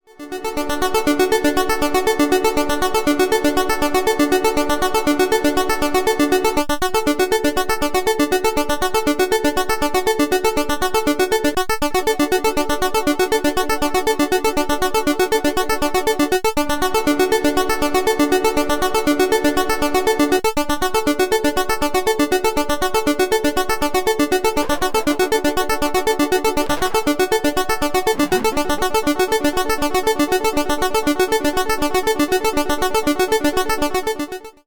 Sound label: Buzzy Reverb modelle; Freds Lab